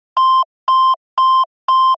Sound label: Heart Stop